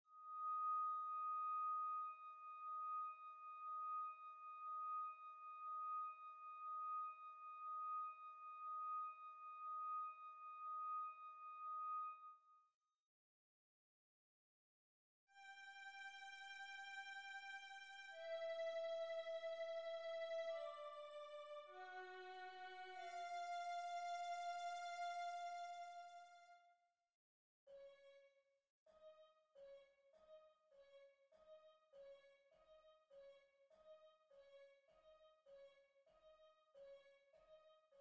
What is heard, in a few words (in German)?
sehr langsam vln